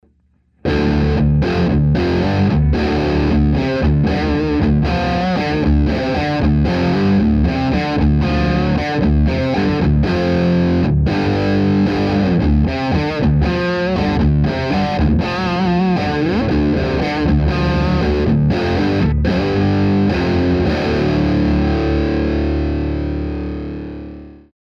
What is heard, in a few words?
heavy